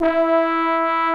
BONES, TROMBONES; Greytsounds VOL-1

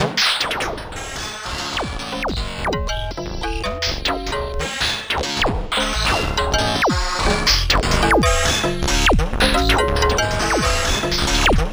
LOFI RA, SynthLine; 90 s Sample CDs; NiceBeats; SynthLine VOL-2

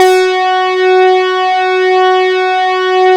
SYN OSC ARP, Microwave; Sound & Vision - Gigapack I CD 2

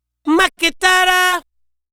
African Harmony Vocal; Continental Drift Aftershocks; Vocals

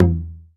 Tubob; Planet Earth; Digital Sound Factory Planet Earth

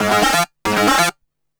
tek 138 f, Techno, pure-synthl; Best Service ProSamples vol.45; Techno ID; instrument-loops

